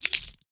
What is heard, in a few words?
idle knuckle crack; smite; kuzenbo